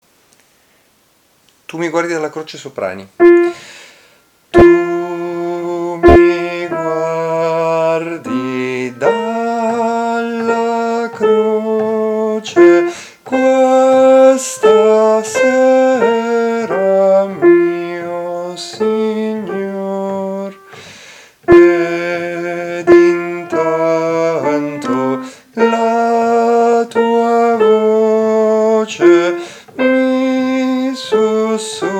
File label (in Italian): soprani; Tu mi guardi dalla croce